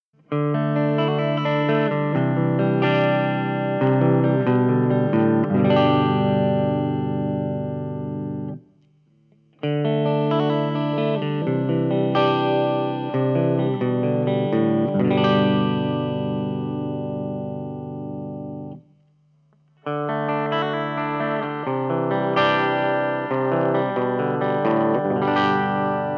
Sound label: Schecter SGR C-1